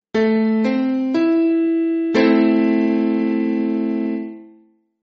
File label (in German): amoll; kunstklang